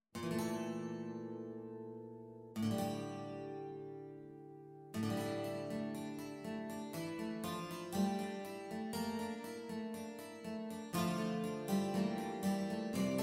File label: Cheer Guitar